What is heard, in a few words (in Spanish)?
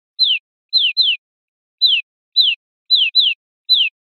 piadas sueltas; verderon